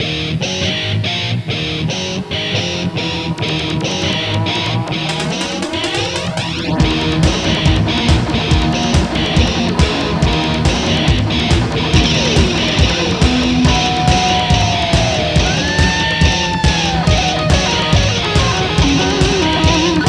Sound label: Splash Screen Music; A-10 Attack! Cuba